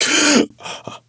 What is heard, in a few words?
gasp; SINDEMO; player; blade